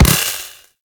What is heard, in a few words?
Web Proj Land; Warframe; Corpus; Arachnoid Wraith; Abilities